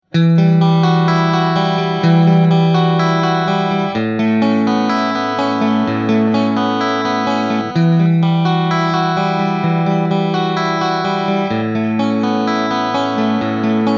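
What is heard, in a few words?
Clean Mid Bridge, guitar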